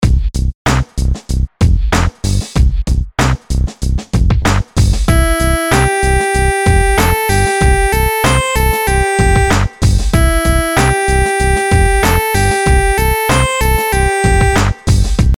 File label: rhythms simple dotted 8s major